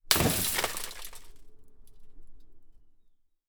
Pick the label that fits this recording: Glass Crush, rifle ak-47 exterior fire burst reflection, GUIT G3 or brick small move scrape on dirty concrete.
Glass Crush